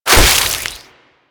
Inf Boomerang Fast Whoosh D; Warframe; Weapons; Infested

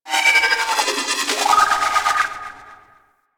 Corpus Ship Mechanical Textures; Ambience; Warframe; Corpus Ship Remaster

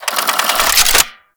Spark Rifle Reload; Grineer

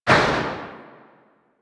SMG Tail; Weapons; Warframe; Tenno; Sundial

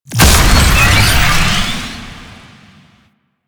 Escort Boost; Warframe; Nineteeen Ninety Nine; PvPvE

